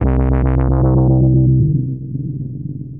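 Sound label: synth bass, drum and bass, 160 bpm, C; eJay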